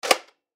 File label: Toy Nerf Gun Load; Miscellaneous Cartoon; Foley